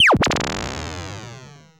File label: REV BLURP, ATMOS FX; AMG - Vince Clarke Lucky Bastard